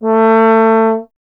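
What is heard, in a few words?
bass trombone; Miroslav Vitous - Solo Instruments VOL-1; 90 s Sample CDs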